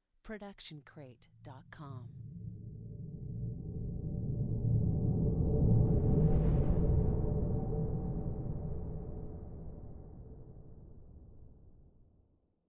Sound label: Low Woosh; soundscrate